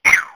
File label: shoot